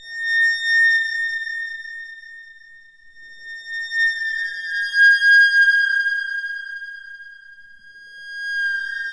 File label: trippy; remixable collections; 105 Dibble Dobble